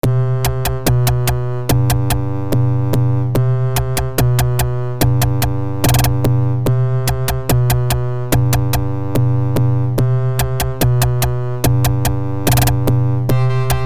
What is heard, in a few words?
High Score